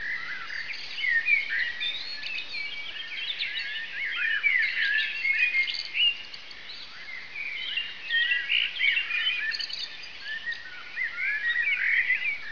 shogun tweeters

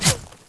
whizz

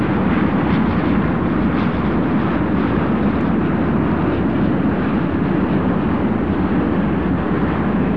engine from ground

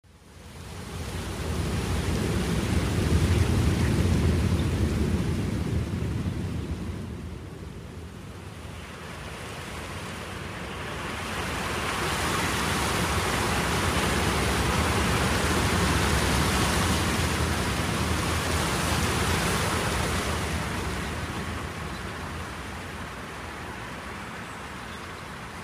ambience country

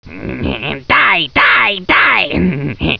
die

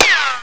ric, bullets

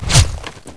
whizz, bullets

